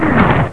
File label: grenade flyby